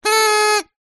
party horn blow toot; zapsplat; foley

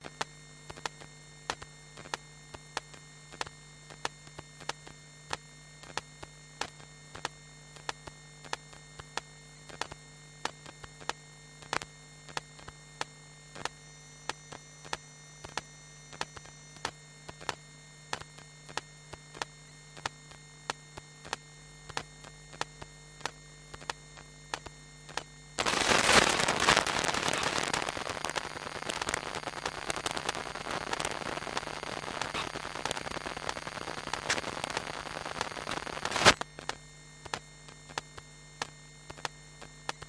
Source RAW Electro Magnetic; PMSFX

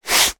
hand rub across cardboard sheet; foley

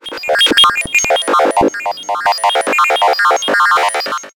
science fiction robot processing information

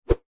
whoosh whip swoosh very fast; foley; zapsplat

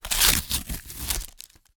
Cardboard Tear

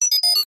science fiction robot beeps chirp short

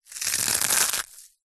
egg shell already broken crush crack in hand

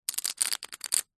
poker chips stack quickly on hard surface

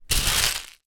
leisure fuse beads hand in grab plastic tub; zapsplat

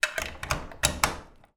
keys mortice lock door with room reverb; household

